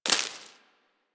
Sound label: Bullet Impact Debris Grass; Warframe; Weapons